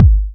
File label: Bassdrum; Vengeance Samples; VEE Bassdrums